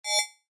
Rollover, Short; Mouseovers; Multimedia; Rollover: Short, Effect; Stuart Duffield

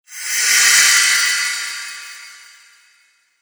Spellbook Of Dread, magic sound effect; Magic Sound Effects; fantasy sound effect; role playing games, magic videogames; Andrew Fleming

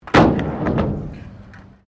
SKATEBOARD HALFPIPE WOOD; Skateboard sounds; Skateboarding; Sound Effects:Sports

